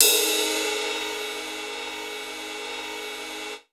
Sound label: CYMB; Houseworx; Drums; Cymbal